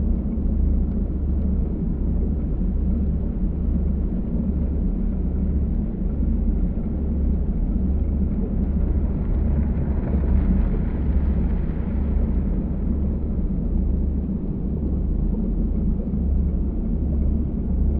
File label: ship research station idle; aquatics